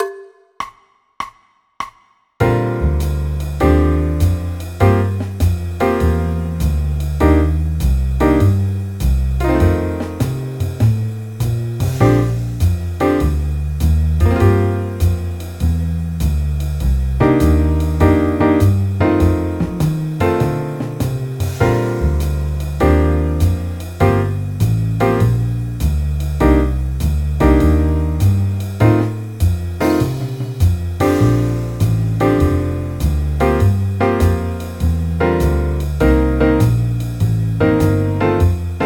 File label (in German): bb dur akkordverbindungen